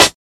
wet snare drum sound b key, snare one shot tuned to the B note